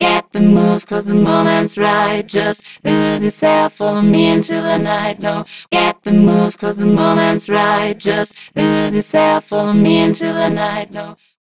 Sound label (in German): Orange Vocoder Pitch Q und Vocoder; Zynaptiq